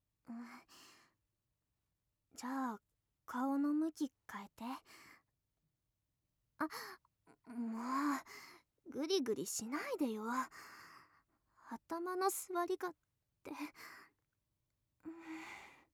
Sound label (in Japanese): 耳かき 右, モノラル・中央音声のみ; 耳かきパート2